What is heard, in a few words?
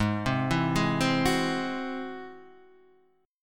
G sharp Augmented 7th G sharp Guitar Standard; G#+7 arpeggiated; 4,3,2,1,1,2; JGuitar